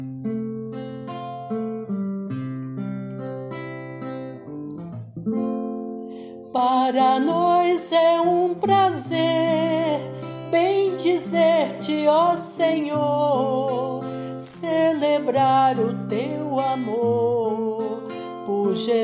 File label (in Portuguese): Bencao cantada